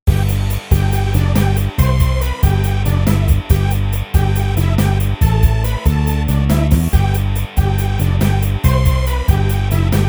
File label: Karaoke